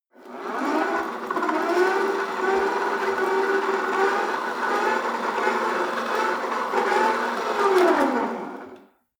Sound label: rope trainer pull sequence slow long, fitnessstudio